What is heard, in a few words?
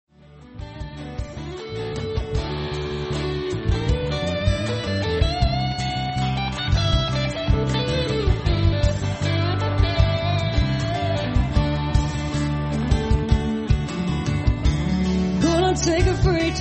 Country Band